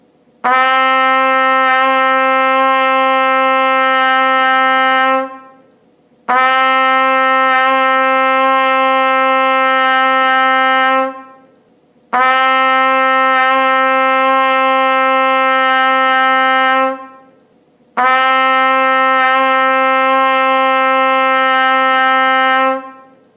trumpet d

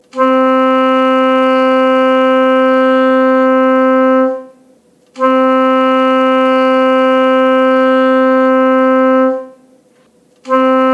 alto sax a

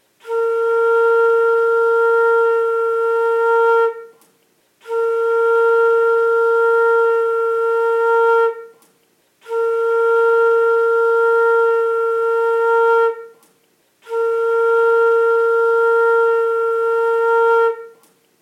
flute bb

